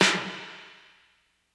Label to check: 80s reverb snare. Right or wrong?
Right